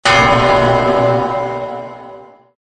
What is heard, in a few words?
killstreak gain kill sound